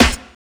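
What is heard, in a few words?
snare drum, 145 BPM, Drum & Bass; Ueberschall; Drum & Bass Flash Trackz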